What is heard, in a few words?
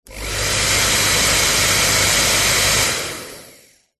household hairdryer on off short burst front professional; visage